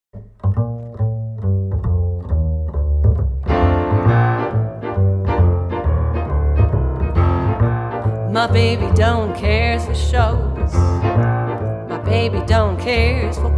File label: Jazz